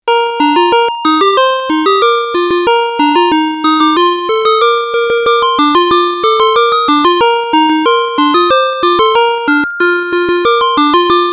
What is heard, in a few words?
Chord B